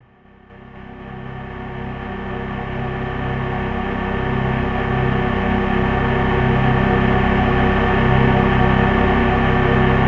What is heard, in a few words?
Ominous; Diddy Kong Racing HD; N64